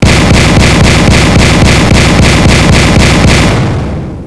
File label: 3 shots